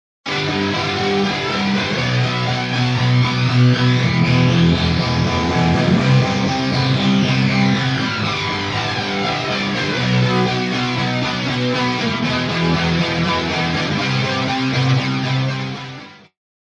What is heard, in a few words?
Shepard Tone Guitar Wet; Chorus; Vox Quantizer; syntheway